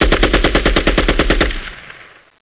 mgun; de loststation cz

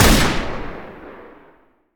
p228 fire, weapons; kuilu

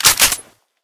five seven slide pull, weapons; kuilu

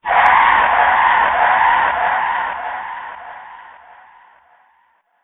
blast; temple

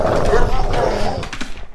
beast pain; morass beta; morassbeast

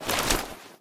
five seven draw, weapons; kuilu

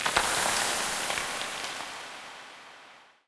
dirt slide reverb; mines

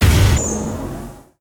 door stop

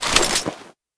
glock reload start, weapons; kuilu

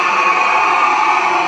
man pain; delugeman

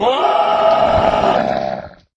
squid die

